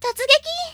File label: menu hit; Fishing Skin; Tom94